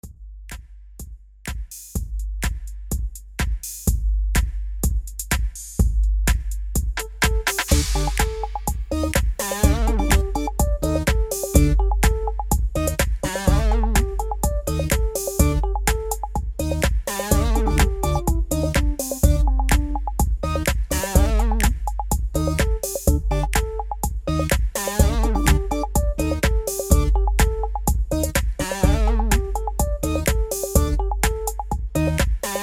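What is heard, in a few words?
Instrumental with background vocals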